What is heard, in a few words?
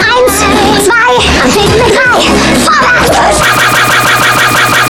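vocals, Jungle; E-Lab; XTC Files of Jungle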